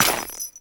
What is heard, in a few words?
Jester Step Ice, Footsteps; Foley; Warframe; Grineer Royal Jester